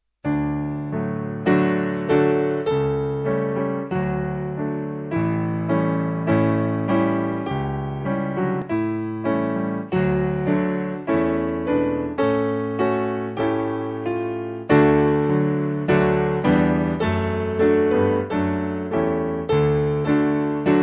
Piano

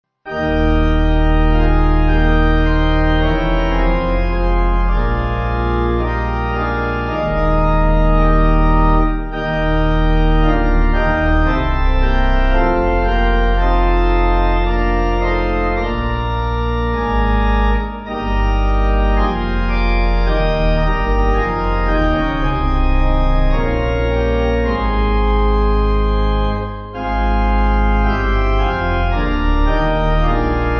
Christ Of The Upward Adsum Pipe; Organ; 5/Eb

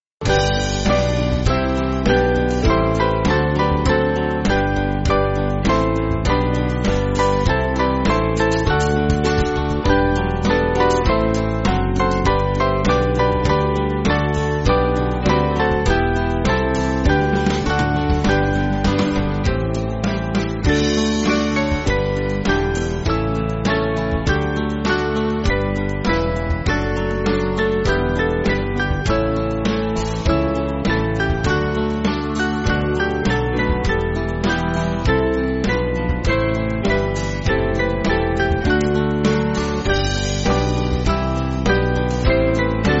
Band

